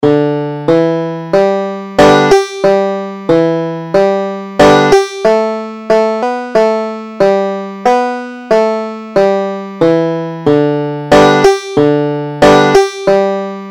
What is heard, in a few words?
down to the river to pray, banjo